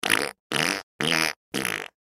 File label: Human Mouth Fart; Mouth Fart Multiple Wet Male Ten Yrs Boy; Human Mouth Fart Multiple Wet Male Ten Year Old Boy